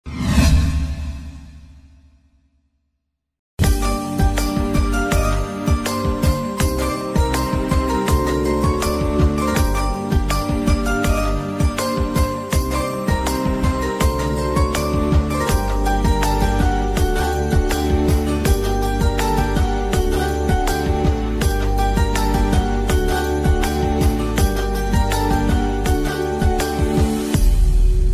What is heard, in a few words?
feeling ringtone bgm, sad ringtone